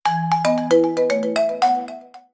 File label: Beating, notifications; Meizu MX4